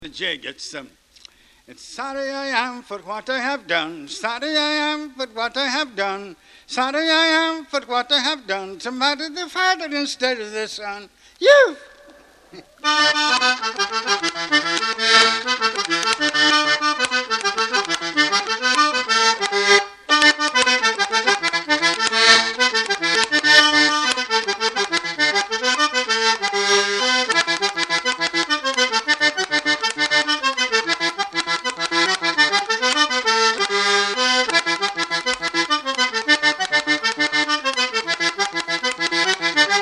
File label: Jig; Sorry I Am